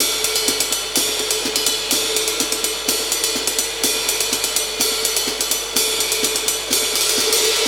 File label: 125 Classic Breaks, 125